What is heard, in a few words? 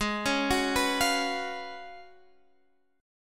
Gb Major 7th Suspended 2nd Suspended 4th Ab, chord